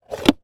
car cigarette ashtray close; transortation

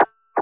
glitched tones Feedback FX

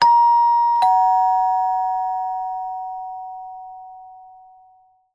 household door bell ding dong hold

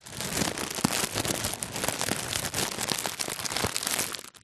foley packet spinach air inside movement handling; zapsplat

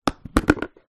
plastic food tub lid unclip open; foley

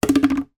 foley bottle plastic drop on side on table

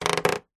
household door wooden creak hinge short

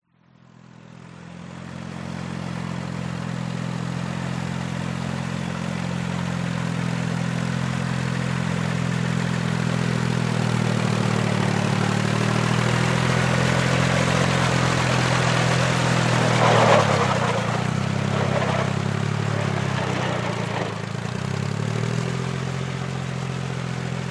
Kubota Tractor Working In Ricefield

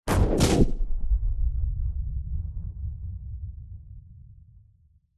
sound design impact heavy rumble tail; zapsplat

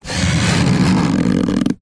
cardboard box flap move slow creak

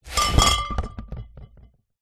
foley box bottles wine slide hard floor; zapsplat

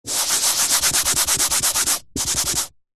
office eraser blackboard rubs various

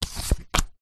leisure playing card turn over on table; zapsplat

